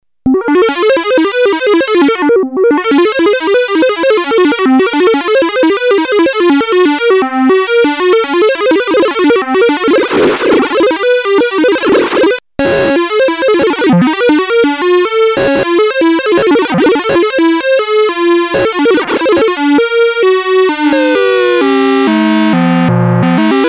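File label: synth sample hold, Moog Minitaur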